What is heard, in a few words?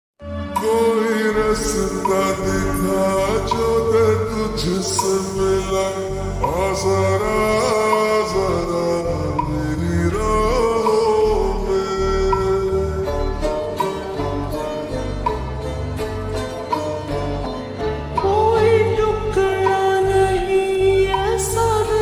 Ringtone slowed and reverb